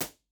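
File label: tom a impulse response, studio live room drum set; genesis 6 studio live room drum set; b-format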